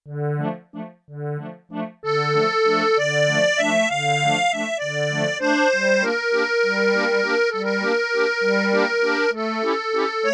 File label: Musette; Yamaha TX16W; Combo Performance 2; SCANDALI-Musette